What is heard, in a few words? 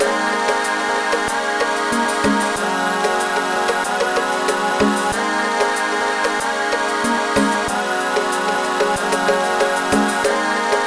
mod disco funk